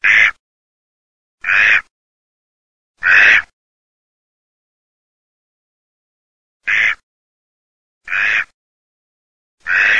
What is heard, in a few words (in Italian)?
GERMANO